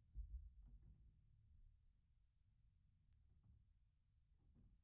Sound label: Piano Mono; Piano Workshop Rec